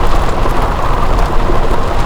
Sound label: car tire rolling loop; vehicles; Tony Hawk's Project 8; XBOX